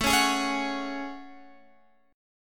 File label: A Major 13th, Guitar, Drop D; chord